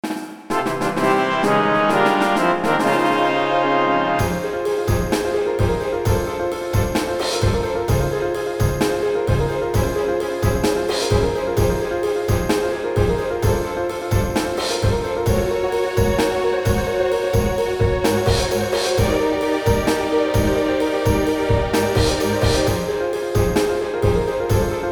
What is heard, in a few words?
Fanfare; FFVII